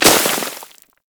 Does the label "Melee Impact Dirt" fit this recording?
Yes